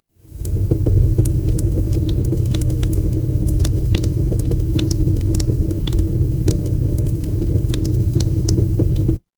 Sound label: ambience fireplace